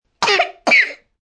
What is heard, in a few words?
Cough Baby Chest, 1 child, short, sick, high-pitched; human voice